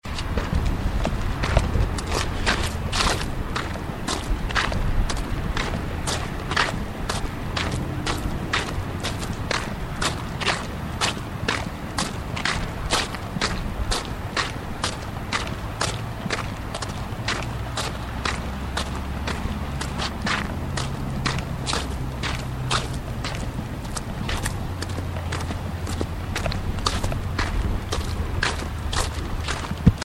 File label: Footsteps On Slushy Cement